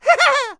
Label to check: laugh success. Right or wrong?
Right